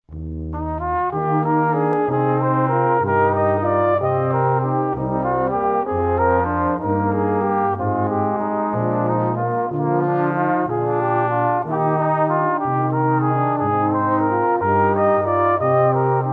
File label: brass; jesu joy